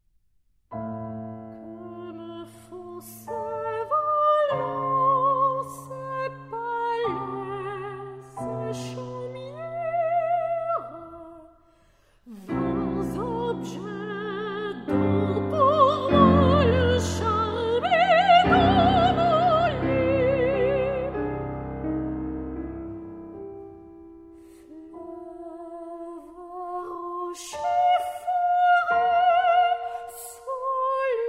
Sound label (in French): Elegie; Quatres melodies